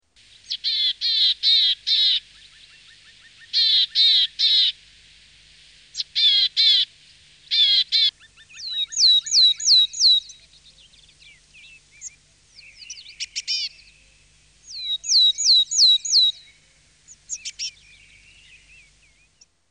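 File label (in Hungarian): Kormosfejű cinege, Madarak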